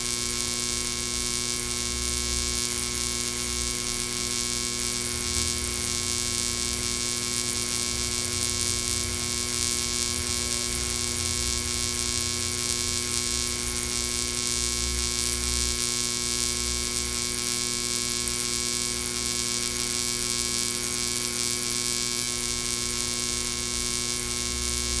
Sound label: neon lights; cstrike